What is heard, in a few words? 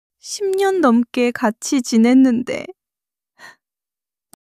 crying; monologue